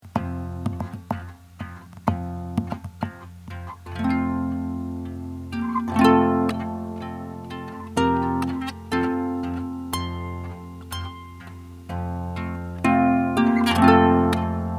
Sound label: Jazz Harp